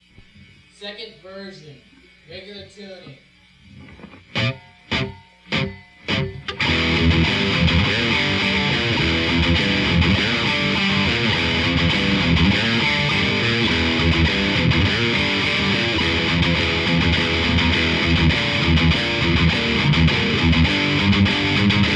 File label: riff